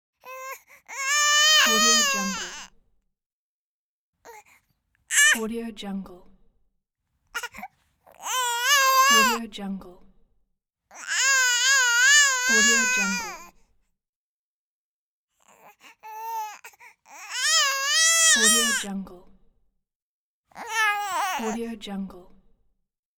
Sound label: crying baby